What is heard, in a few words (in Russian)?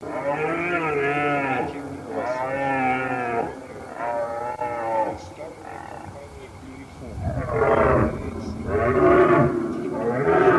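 Олень Cervo europeo, манок; plurifon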